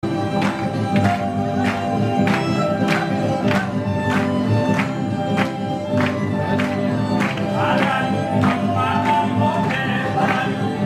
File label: Tarantella Wedding Italian Ringtone